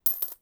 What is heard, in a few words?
Coins; Feel; NiceVibrations